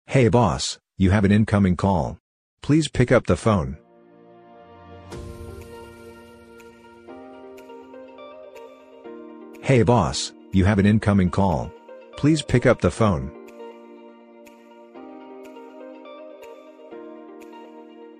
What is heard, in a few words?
Incoming Call